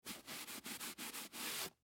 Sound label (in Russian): zvuki vafelnogo polotentsa